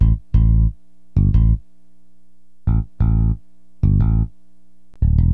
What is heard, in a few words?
BASS; LiveAct Pool